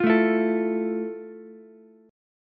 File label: F Major 7th F, Ukulele; strummed; JGuitar